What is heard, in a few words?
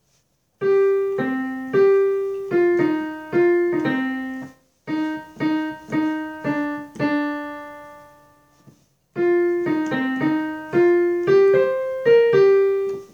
Alto